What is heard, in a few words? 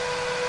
SNES Racing Engine Loop